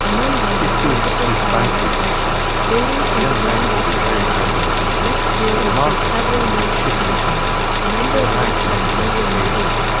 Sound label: noisy sound; noisy environments; conversation; Denoising in Low SNR Environment; An algorithm for clear conversation in noisy environments